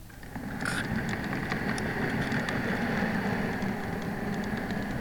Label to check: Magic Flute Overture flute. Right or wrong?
Wrong